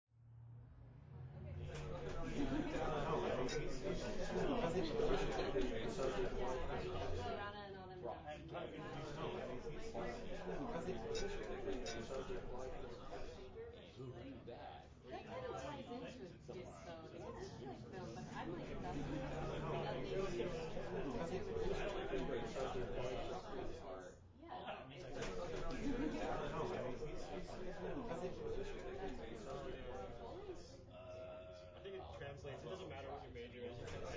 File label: room ambience